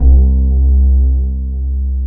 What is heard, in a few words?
BASS 170 BPM, Drum & Bass; Ueberschall; Drum & Bass Flash Trackz